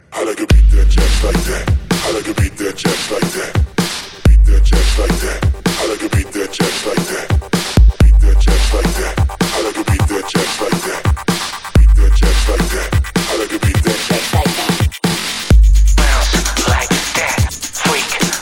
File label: beat